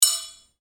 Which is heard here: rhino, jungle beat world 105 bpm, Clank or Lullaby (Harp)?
Clank